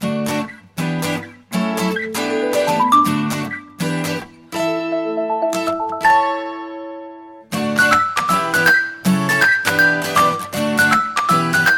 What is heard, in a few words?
Chocolate notification; اعلان